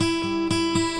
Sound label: acoustic guitar picking